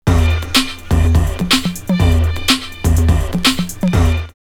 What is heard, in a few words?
drumloops Rave 124; X-Static Goldmine; 90 s Sample CDs